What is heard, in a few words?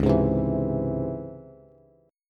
C sharp Minor Major 7th C sharp Bass; C#mM7 strummed; JGuitar